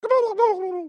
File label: Gobbler